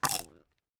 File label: Raptor Bite Sounds